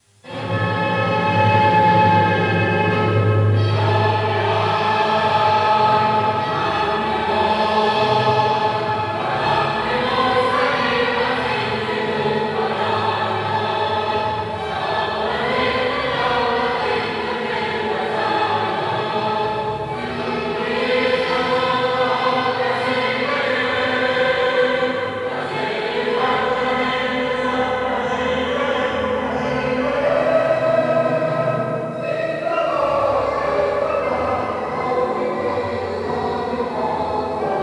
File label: Tajikistan anthem